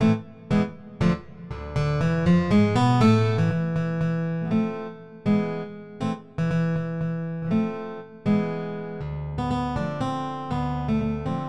guitar

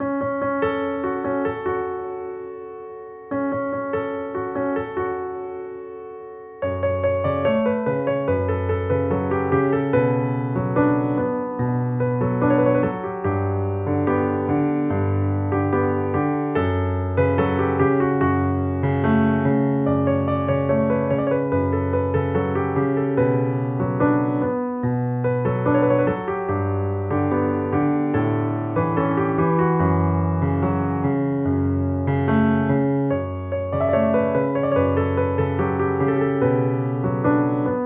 piano

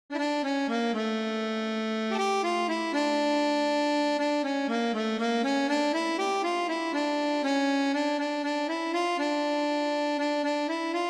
harmonica

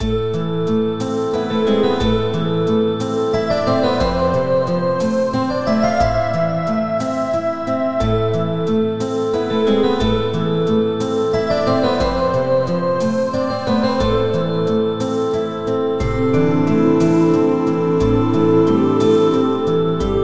keyboard

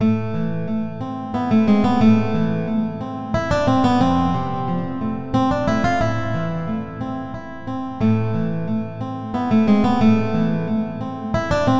guitar

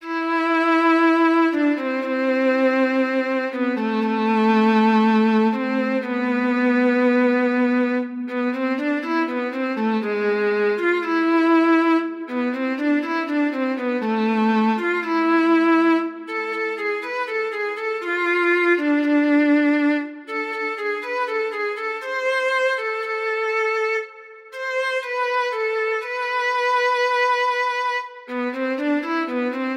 violin

